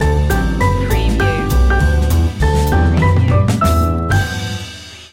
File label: jolly old st nicholas jazz stinger